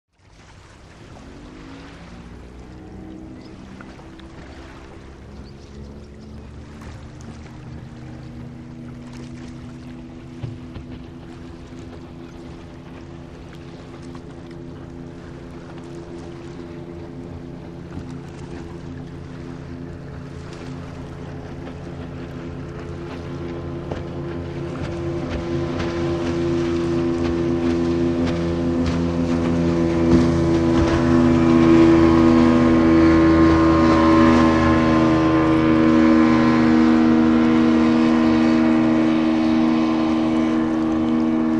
Boat with Engine; By; Boat In And Away With Water Lap.; powerboat